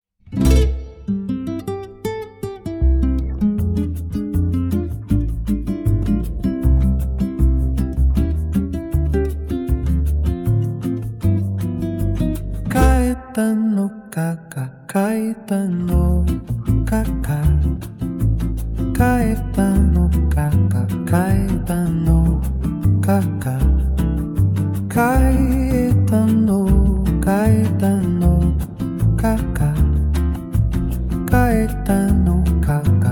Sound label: bossa nova